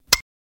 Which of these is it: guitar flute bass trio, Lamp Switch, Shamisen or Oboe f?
Lamp Switch